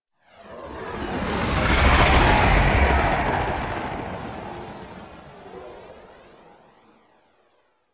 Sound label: Fly By Slow; Blue Sky Liset